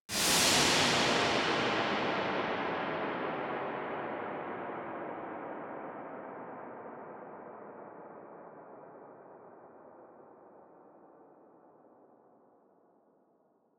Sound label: Noise Verb Decays; stereo toolkit samples